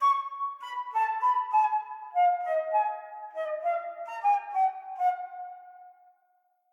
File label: Detune; Toki Tori 2